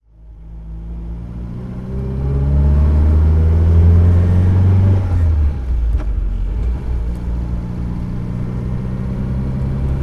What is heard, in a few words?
riley rmd cabriolet driving; Riley RMD Drophead Coupe, 1951; Engine sound driving; V3D/ ClassicarImages; Marc Vorgers